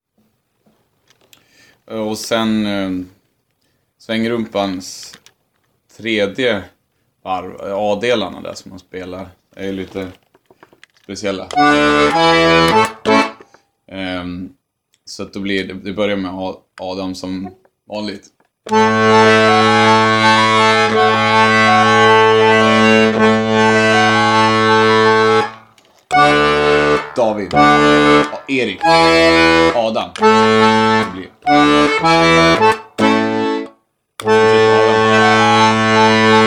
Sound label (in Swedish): komp